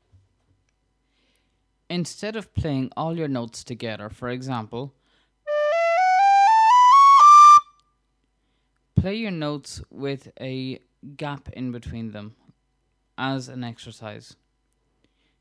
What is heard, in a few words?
tutting